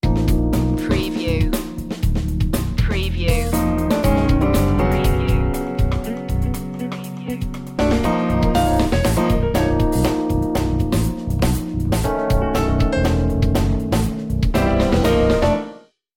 fusion sting

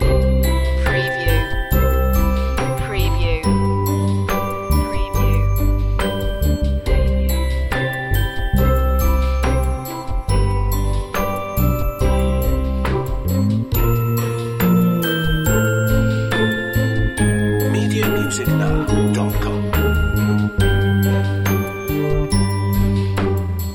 lullaby beat

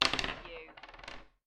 Dice Throw One Die On Wood Table

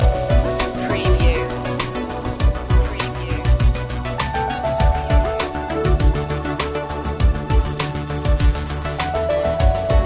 forward thinking loop

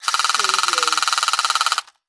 Toy Machine Gun

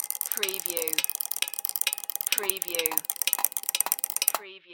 Mechanical Gadget

